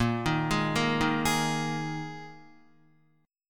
A sharp 7th Suspended 4th down Guitar 4E 4A 5D 5G 5C 6F; A#7sus4; arpeggiated